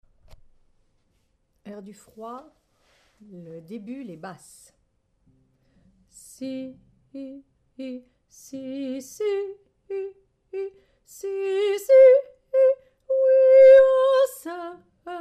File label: Basse